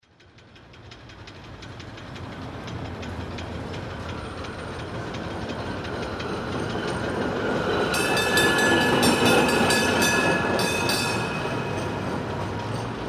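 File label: tram passing by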